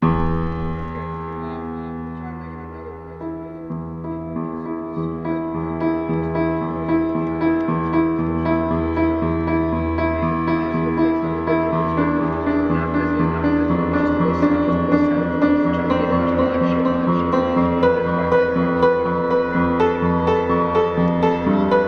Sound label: Piano Player